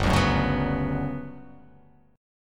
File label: G Major Sharp 11th G down, chord; strummed; JGuitar